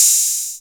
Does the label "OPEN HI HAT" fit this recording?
Yes